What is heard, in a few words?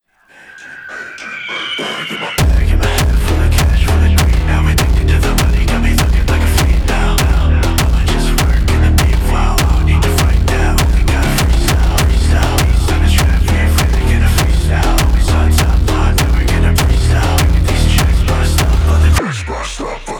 stance phonk